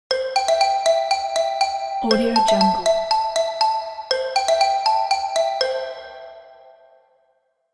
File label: story happy relax